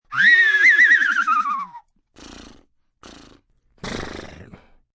Horse, Animal sounds, horse, comedic (human voice); Funny Sound Sound Effects; Funny Sounds (Sound Effects:Comedy); VoiceBox; Twistedtracks